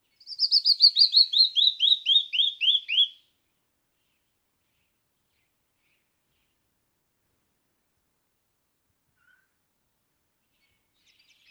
Canyon wren; Ritardando—canyon wren

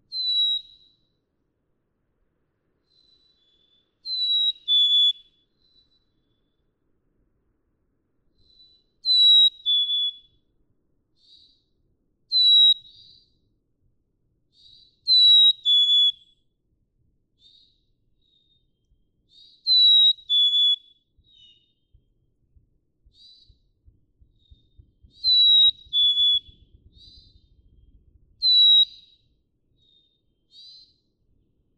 Black-capped Chickadee; Pitch-shifting, retaining melody—black-capped chickadee

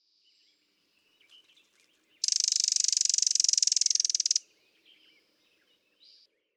Common Yellowthroat, birdsong; June 4, 2018. William L. Finley National Wildlife Refuge, Corvallis, Oregon.; Recorded by Janet Grenzke

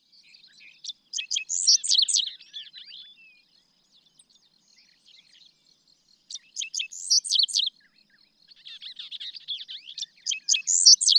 Dickcissel, longer recordings; longer recordings from those three individuals

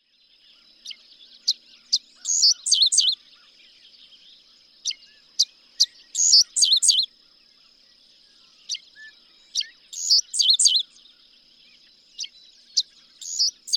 Dickcissel

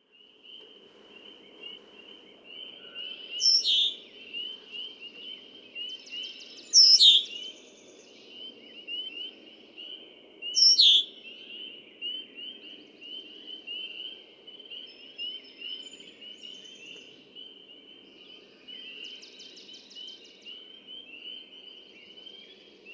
Red-winged Blackbird

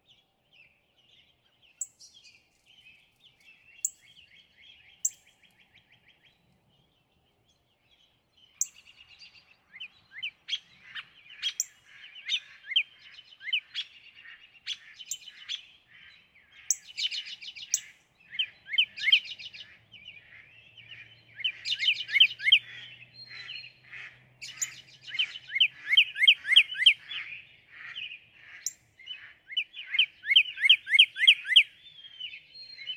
Northern Cardinal

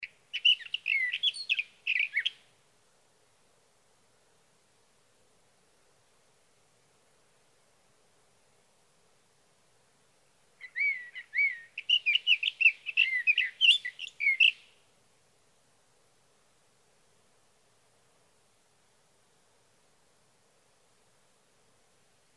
Sage Thrasher; Sage thrasher songs, through the night.

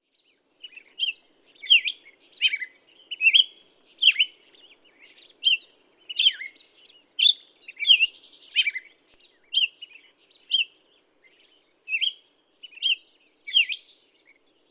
Black-headed Grosbeak

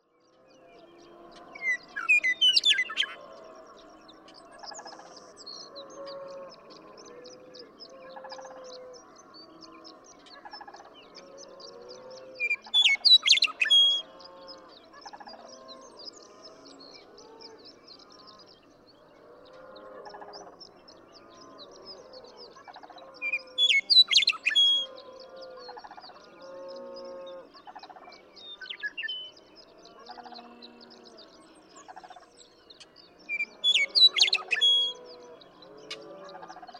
Western Meadowlark; The joy of western meadowlark songs.

